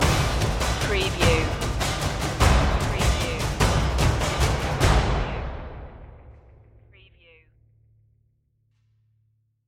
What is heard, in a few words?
epic hymn perc stinger